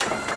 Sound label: droid servo